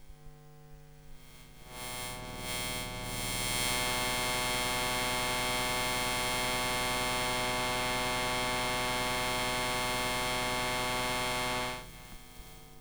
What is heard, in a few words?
EMF xbox 360 hiss and ejecting cd; Soundholder